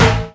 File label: Tom, Percussion; Vengeance Samples